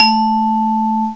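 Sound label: GLOCKENSPIEL, MALLETS; CMI Sound Library Rev 1.3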